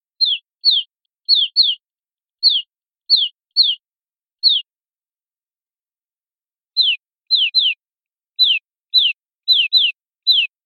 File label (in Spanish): piadas sueltas